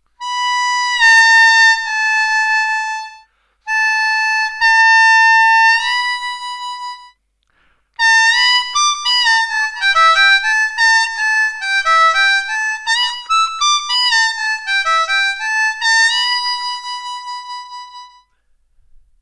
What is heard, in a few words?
6 hole bend on D harp; 3rd Position Blues Harp – An Introduction